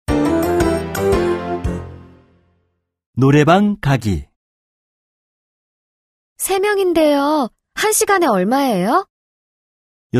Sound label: K-POP